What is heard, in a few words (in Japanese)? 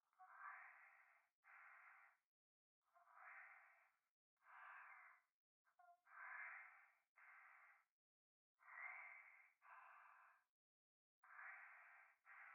風呂 右耳密着吐息